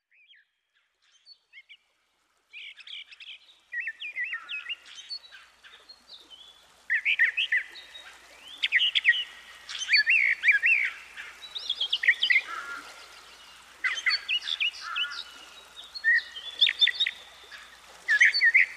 mockingbird with fish crow tufted titmouse wood thrush house; dan gibsons solitudes